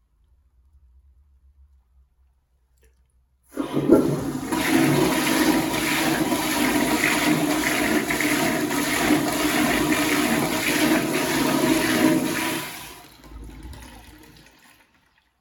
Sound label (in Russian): sliv unitaza